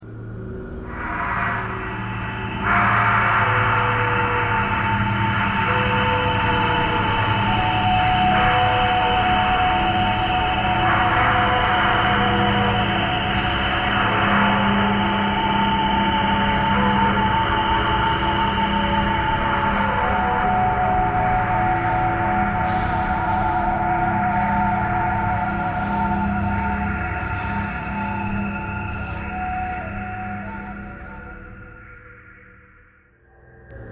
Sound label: thread noise